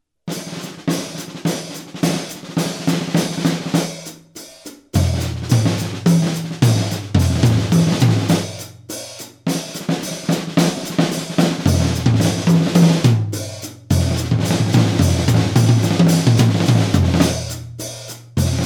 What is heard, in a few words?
snare and toms